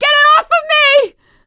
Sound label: scream while pounced, survivor voice; producer